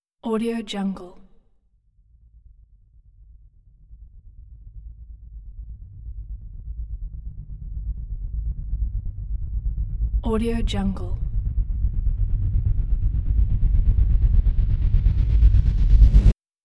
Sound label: deep pulsing reverse; hollywoodedge